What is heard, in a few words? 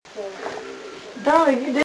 growl